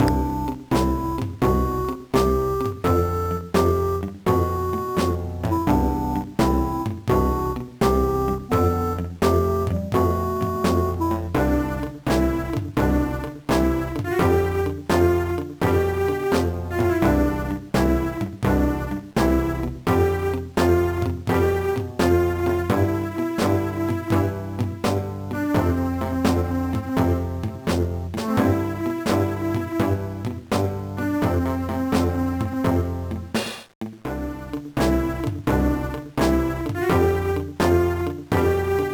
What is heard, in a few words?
Country Jump Rope; Wario Ware Inc. Mega Microgame; 2003 gba